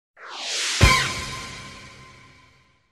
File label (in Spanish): ping chasss; SONIDOS WHATSAPP